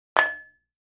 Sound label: Free Weights Exerci